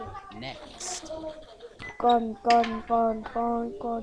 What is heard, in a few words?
gun